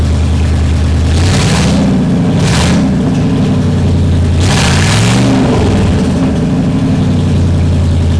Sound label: Big Red Gun, victory siren